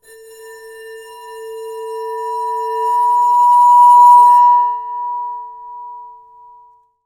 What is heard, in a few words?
Bowed, Large Wine Glass